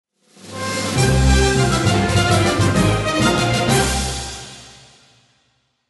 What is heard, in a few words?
BGM Fanfare